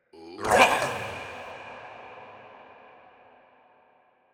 Processed Battle Shouts, Viking Vocal

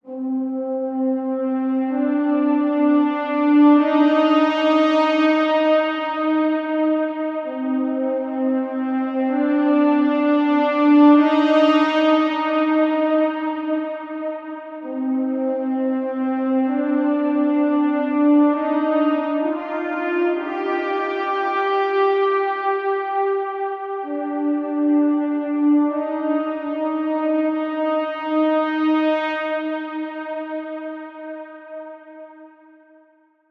Brass - Cm 65Bpm; Construction Kits; STEMS; Ghosthack; SkyRealm; Kit 02 - Solomon Hill

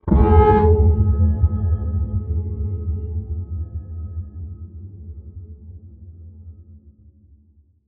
Stress

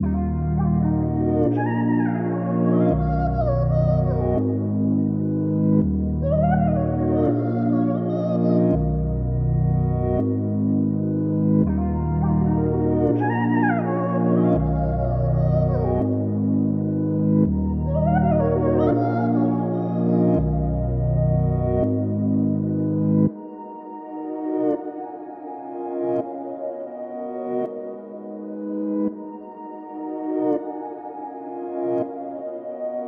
165 BPM D# Min, Melody Loops; All I Need; Cymatics; Orchid Sample Pack

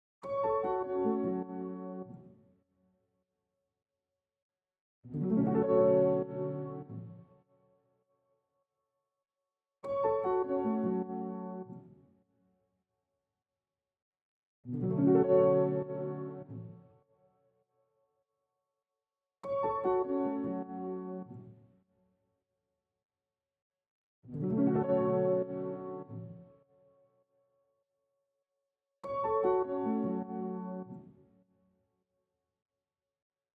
100 BPM E Min Piano; Loop Stems; Cymatics; Infinity Melody Collection; Betrayals

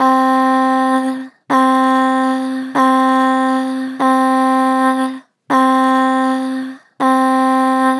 Ahh, Vocals, Pitch Flat Gentle; Harmor Vocals

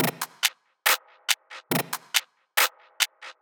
Top Loop 140 BPM, psytrance; Loops

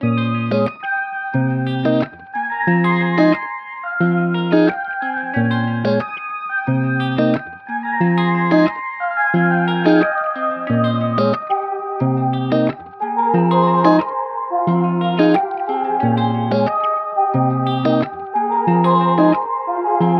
180 BPM F Min, Guitar; Cymatics

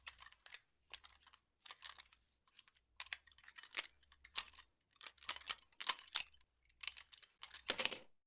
Gun Disassemble; Fairlight Sound Lib

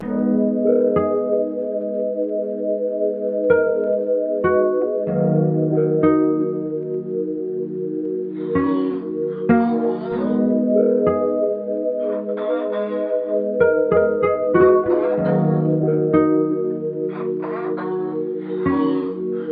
95 BPM D# Min, Vintage & RnB Melodies; For You

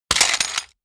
Gun Pistol Slide Across; Fairlight Sound Lib

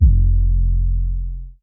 808 F, Basses; Cymatics; Eternity Sample Pack; 808s

